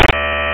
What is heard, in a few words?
camera